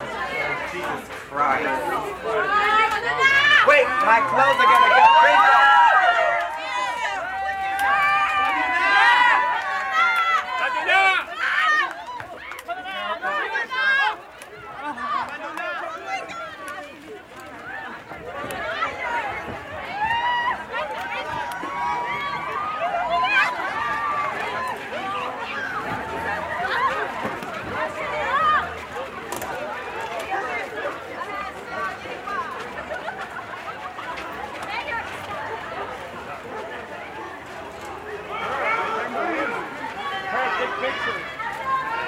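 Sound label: Crowd Small Reactions, parties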